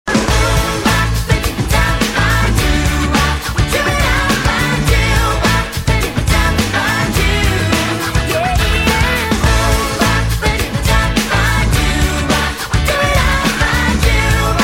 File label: ringtone; greg house